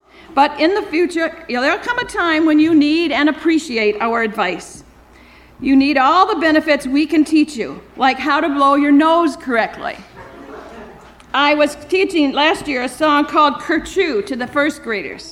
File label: Kerchoo